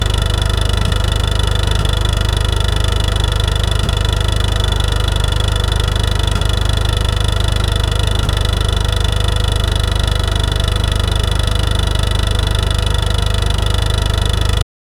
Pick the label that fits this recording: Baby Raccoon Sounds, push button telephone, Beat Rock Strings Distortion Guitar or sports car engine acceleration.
sports car engine acceleration